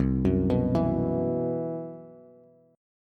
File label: C Major 7th Flat 5th C, Bass; CM7b5 arpeggiated; JGuitar